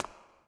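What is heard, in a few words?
reverb run, footsteps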